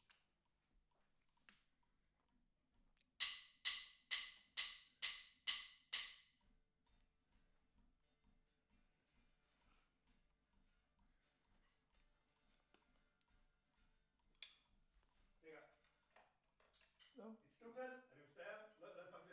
TB GTR

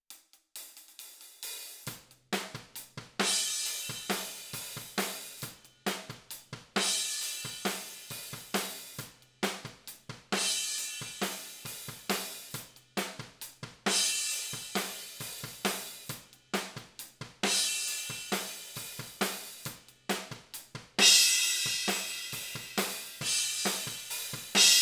Ride

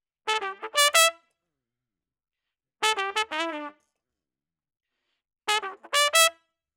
Trumpet

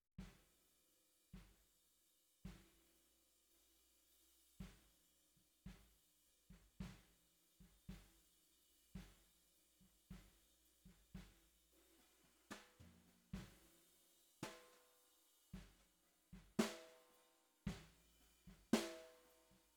SNR Bot

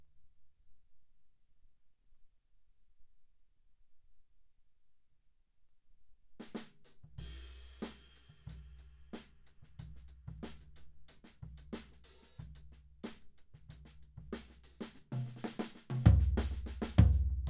Floor

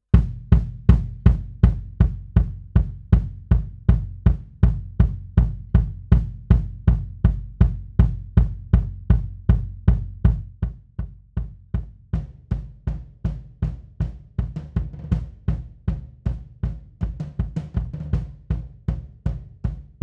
Kick Out, 160 BPM

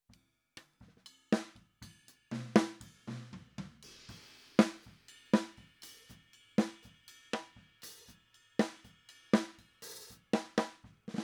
SNR

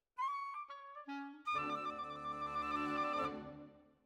Flute

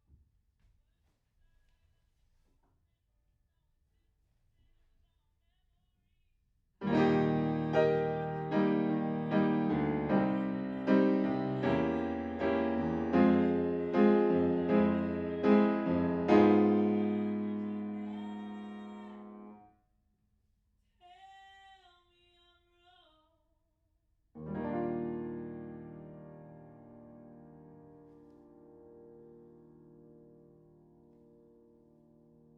Piano Room

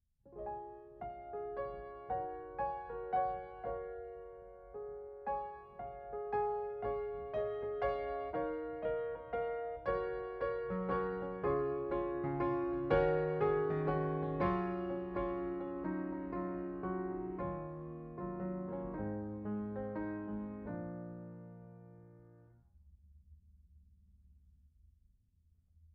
Piano Mono

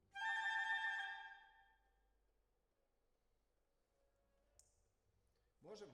Decca, Jazz for piano

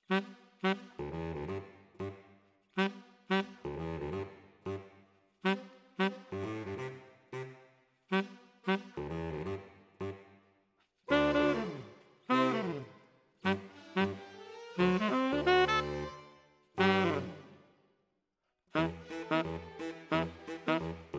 Tenor, RNR for piano

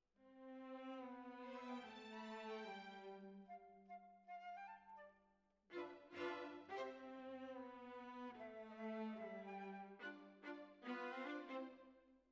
Violas Over

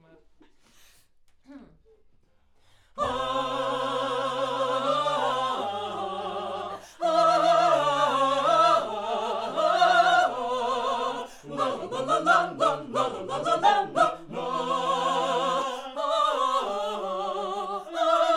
Backs AKG LEFT

